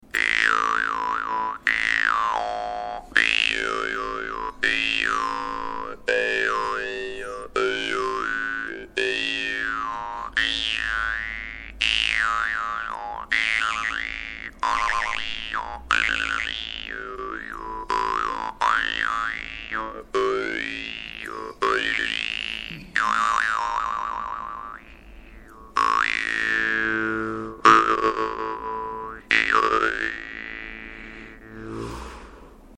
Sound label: vargan; bushman